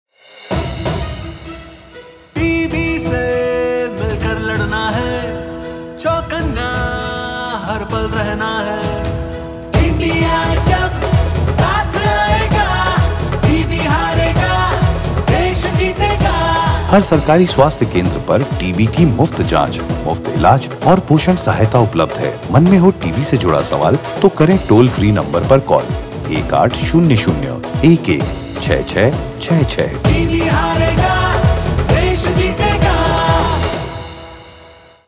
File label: Jingle; IEC Material; NTEP